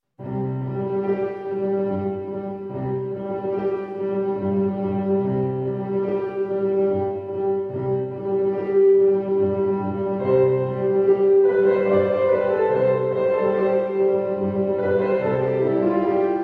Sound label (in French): Transcription pour Piano 4 a mains